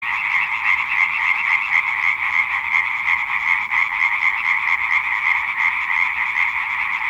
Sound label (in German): laubfrosch